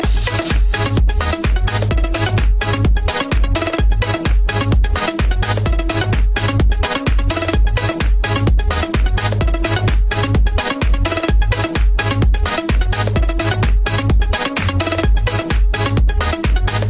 New Beat

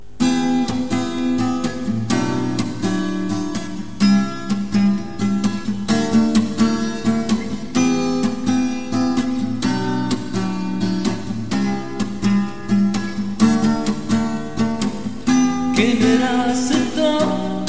Nice Guitar

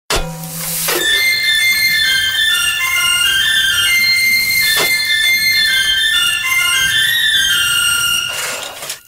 Song Bird Call

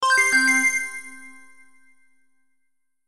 Wind Chimes

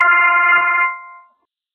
Basic tone

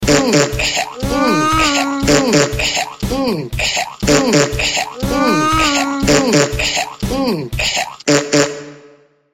Human Sounds

